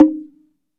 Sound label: SLIT DRM D#4; SLIT DRM1; Heart of Africa